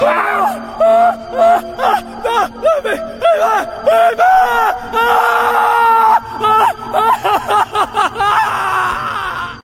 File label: bavarian scream